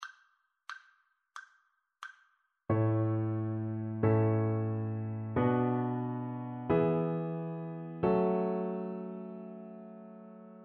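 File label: harmonic error detection, string quartet; ear training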